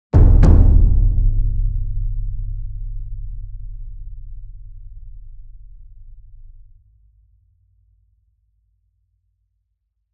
ENSEMBLE DRUM Bass Drum Concert Large Double Near; boom library; Cinematic Strikes Construction Kit

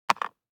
DICE on Cardboard, Drop, Standard, 3 Three Dice; Samples; ArticulatedSounds; DICE Sfx Party (Extended)

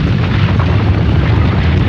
Roll Stone Pillar Loop; SFX Kit; Foley - Misc

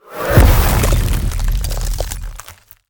Ice Spell Explosion Impact Glacial Burst; Ice Explosions & Impacts; Arctic Aura

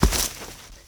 Bodyfall Hay; SFX Kit; Bodyfalls

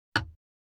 cartoon bonk; NCH Library

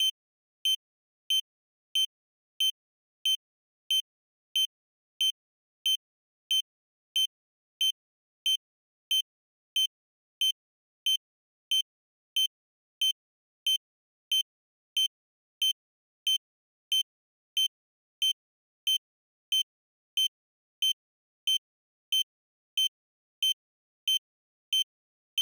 Heart Monitor Vital; Medical; Blastwave

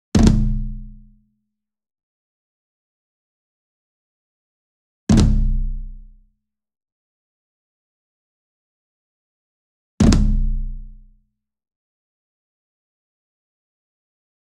ENSEMBLE DRUM Concert Toms Flam Near; boom library; Cinematic Strikes Construction Kit

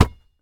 Street Ball Rebound Pull; SFX Kit

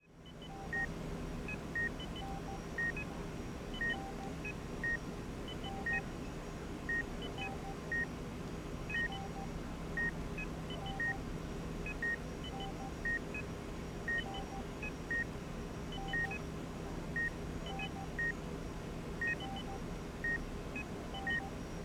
Hospital Room; Medical; Blastwave

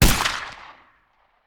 Musket; Sound Ideas; Guns

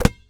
Street Ball Rebound Grab; SFX Kit

